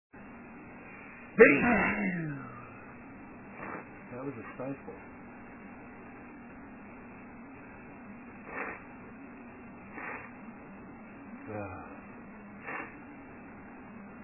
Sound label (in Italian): Sternuto, male